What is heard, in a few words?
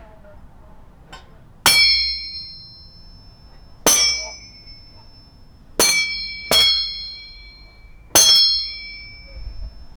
clanging of a blacksmith